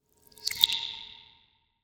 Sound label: water swell; soundscrate